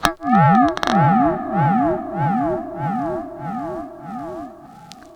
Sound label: SPACEY R, Funk Guitar; Zero G - Funk Guitar